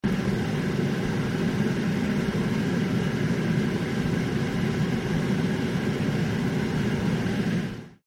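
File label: Space Ship Interior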